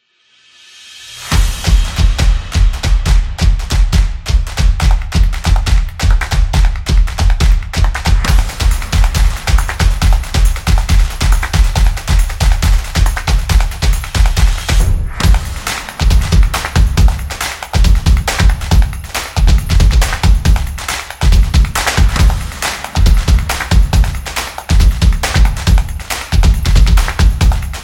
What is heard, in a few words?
Percussion; drumming action